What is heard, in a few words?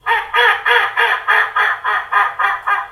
pissed off duck; NZ 2016; Kepler Track; Iris Burn Hut; Mike Koenig; Iris Burn Hut 27th Feb